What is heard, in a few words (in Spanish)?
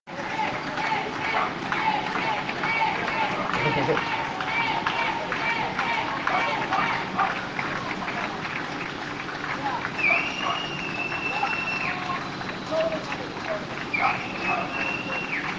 Aplausos a trabajadores; de la EPE